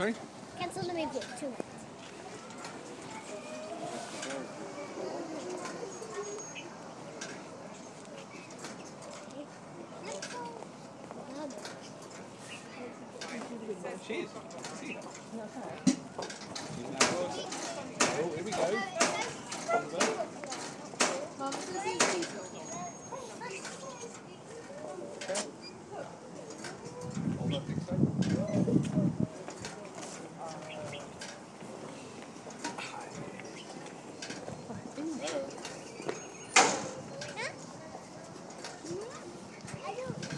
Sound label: bird clock